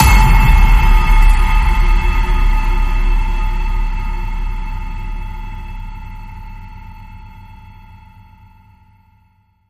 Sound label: Ping, A